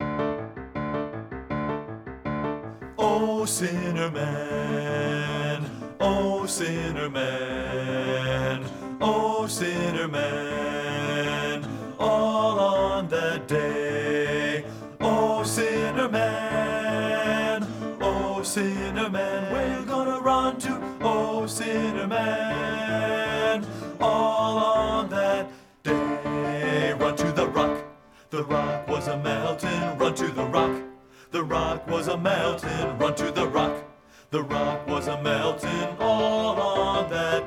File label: BASS; SINNER MAN